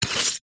knife scrape dirt

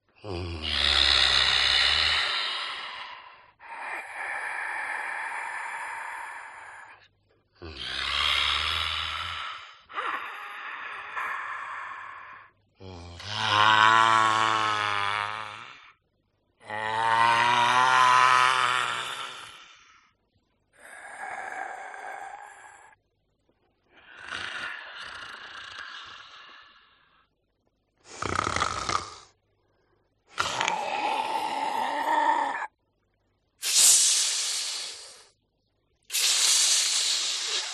Sci Fi Creature; audio hero

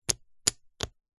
foley wood blocks small x3 stack slow

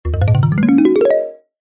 cartoon ascend climb med mallet; zapsplat

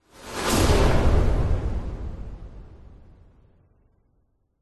explosion designed big fizzing reverberant

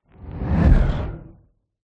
fantasy magic spell zap whoosh impact dark airy

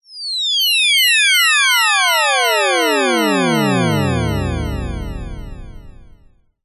science fiction retro falling shrill; zapsplat

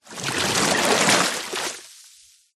nature water deep surface movement slosh

